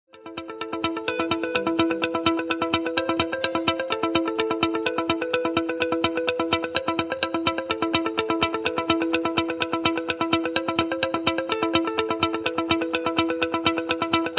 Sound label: Echos Delay